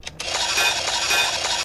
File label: Clock Caveman Mecha